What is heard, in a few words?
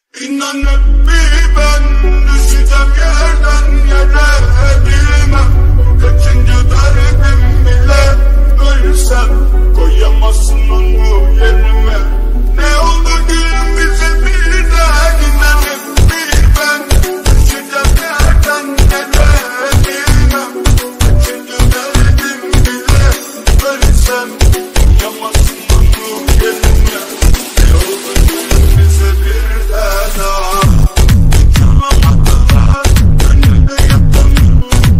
remix bass relax music